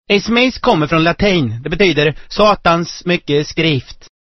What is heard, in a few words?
sms swedish